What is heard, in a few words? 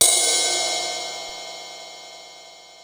Dance Ride, Ensoniq ASR-X Pro